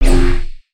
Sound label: scythe swing; weapons; aronwk collection; ndaudio